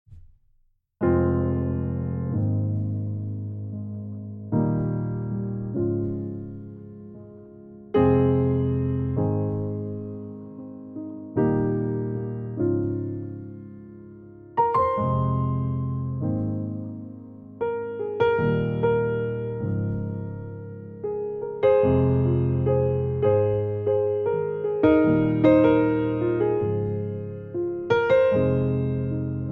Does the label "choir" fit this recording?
No